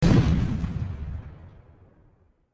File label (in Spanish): explota Bomba